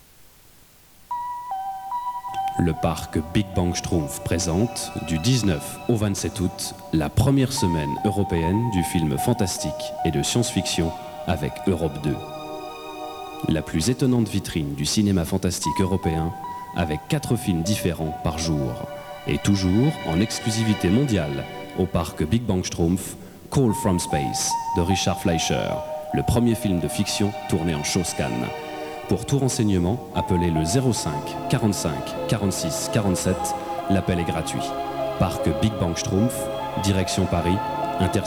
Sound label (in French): pub film fantastique